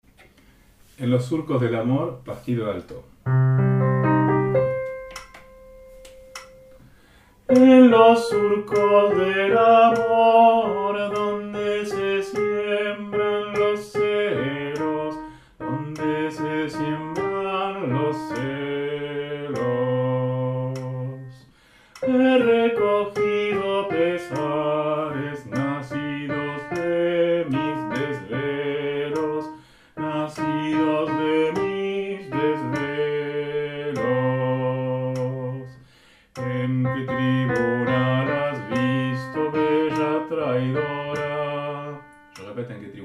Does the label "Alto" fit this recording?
Yes